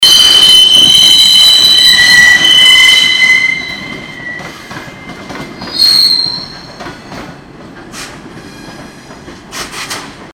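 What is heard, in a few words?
sound of railway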